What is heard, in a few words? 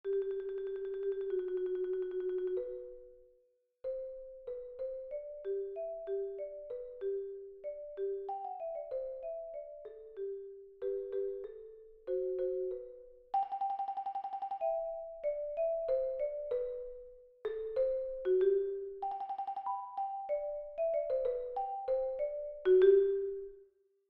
percussion etude